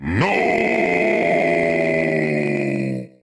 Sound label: noooooooooooooo; BALLY; voice; TWILIGHT ZONE; Pinsound; Twilight Zone Reorchestrated